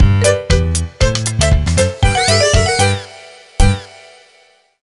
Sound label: Music Mini Game Win; Littlest Pet Shop 3 Blue Team Pink Team Purple Team; NDS